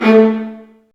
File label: String Sections, Violas Marcato; Roland LCDP13